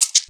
Percs

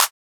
Claps

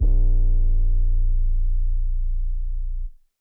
808s

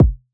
kick trappy tight; Kicks

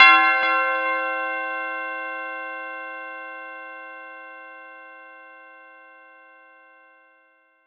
Bell

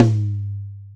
Tom

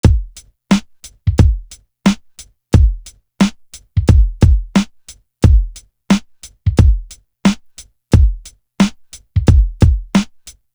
Drum; Sonoton

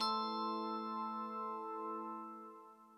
ORGANBELL

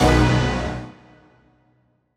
chords hit B

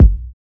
Kicks

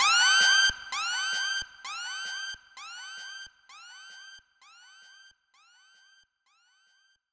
Airhorn

